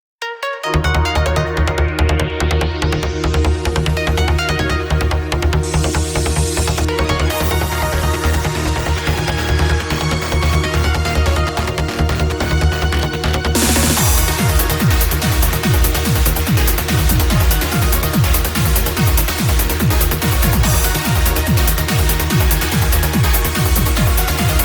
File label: instrumental